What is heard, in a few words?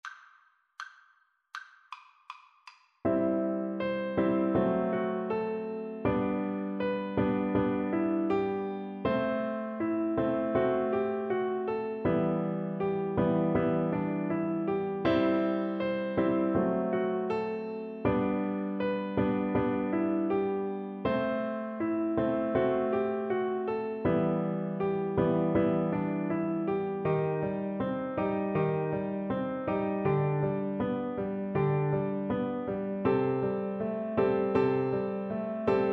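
borodin polovtsian, vibraphone; Percussion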